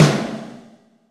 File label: Snare, Alesis DM5 Drum Machines